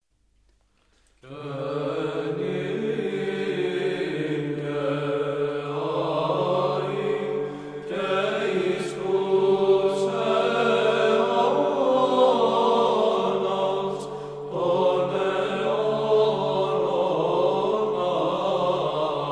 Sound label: Sticheron, Greek Byzantine Choir; Petru Lampadarie; Christmas Hymns; Lykourgos Angelopoulos 1979